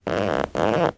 household chair sofa squeak creak as person moves around on it